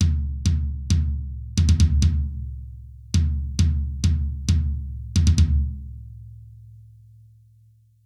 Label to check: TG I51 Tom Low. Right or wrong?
Right